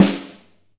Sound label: Snare